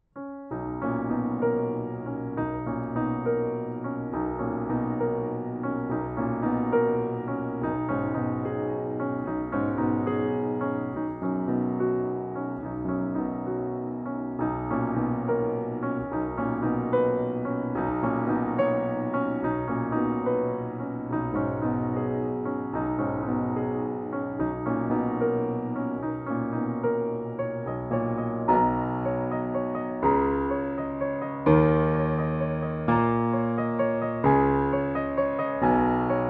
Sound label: piano